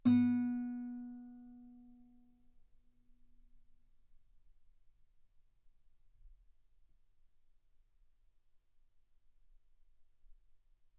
Guitar B3

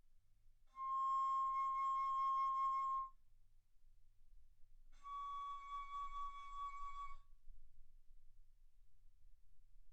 Bass Flute C6 Db6; ff

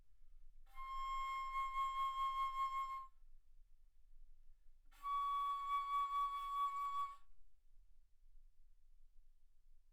Bass Flute C6 Db6; ff

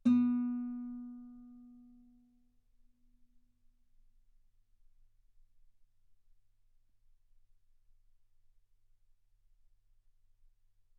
Guitar ff B3; sul B

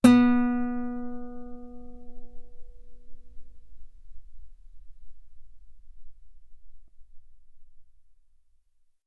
Guitar B3; ff

